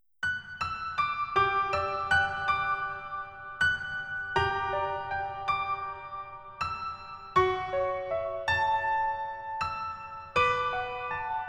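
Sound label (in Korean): Piano version 멜로디MR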